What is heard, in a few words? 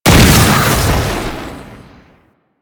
Concrete Archgun Projectile Empowered Start; Warframe; Archwing